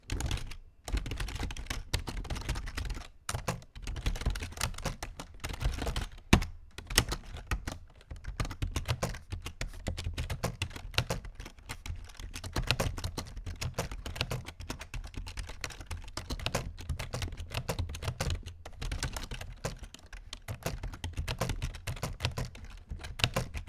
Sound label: Typing